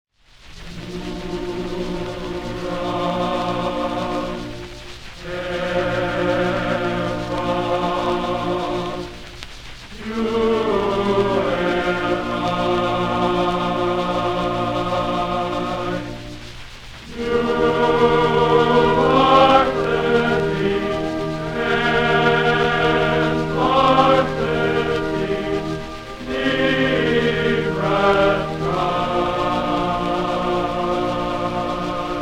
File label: chant, band